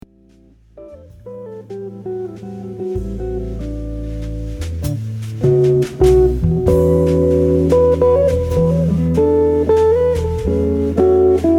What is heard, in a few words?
Solo